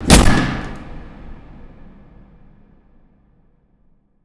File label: impact hatch; Hits